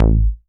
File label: short bass; Masterbits; Dance Trax